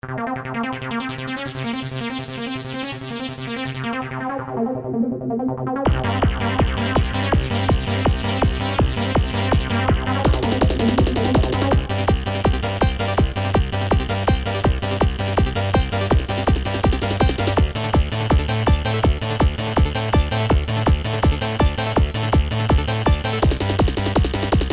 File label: Yamaha QS300; Tekk it